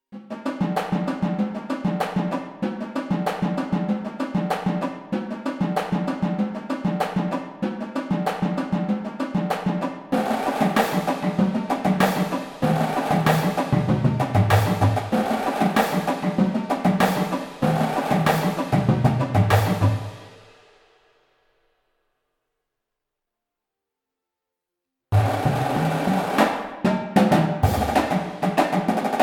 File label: full drumline